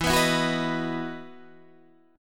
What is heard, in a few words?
E Major 7th Suspended 2nd, Guitar, Standard; EM7sus2 strummed